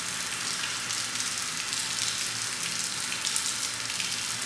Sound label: int rain lp, ambient weather; aztec; Counter Strike Model Pack; CS:GO